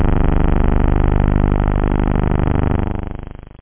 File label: analog; OctaMED SoundStudio V1; synth misc